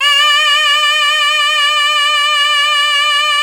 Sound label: MIZMAR